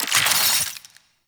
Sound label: Infuse Ice UI; Warframe; Warframes; Alchemist